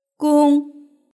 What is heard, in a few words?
gong; jinggongzhiniao